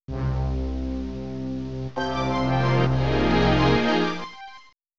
Opening Dramatic SFX

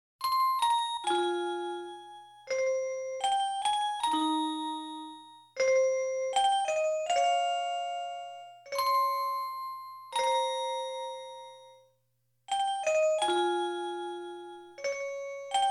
Organ

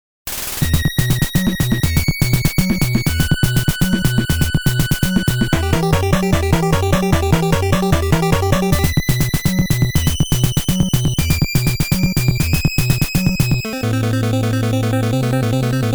Battle 4 Last Boss

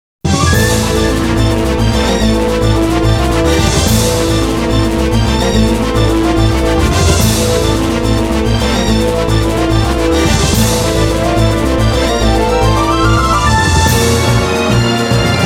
The Great Drum

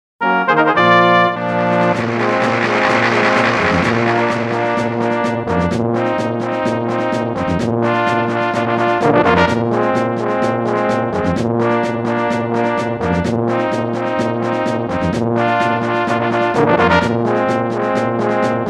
Level Up

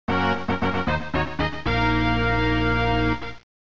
Colosseum Victory

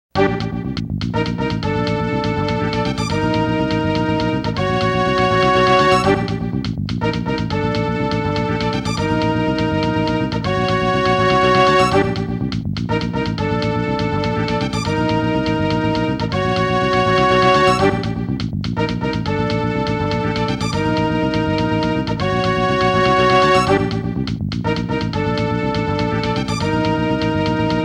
Enemy Attack

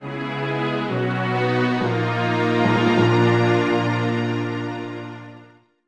Multiplayer Defeat

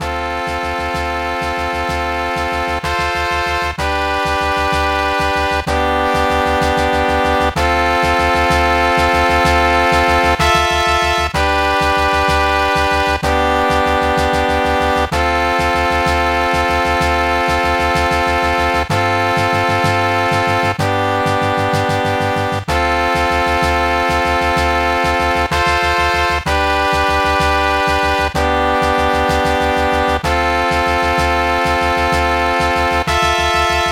Village

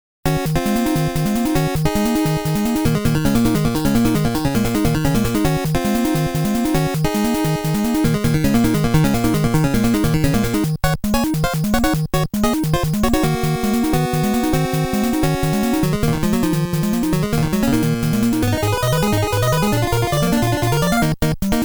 Battle 2

